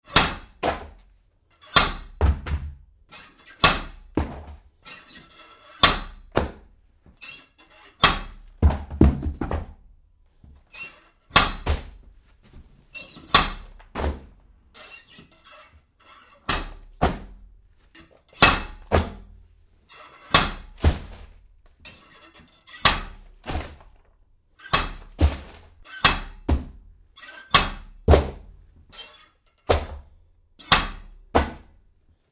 Letterbox Mailbox, Various letters and packets through mai; Various letters and packets through mailbox landing on door matt; Miscellaneous Household; Tom Hutchings